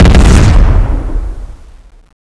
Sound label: rocket hit, weapons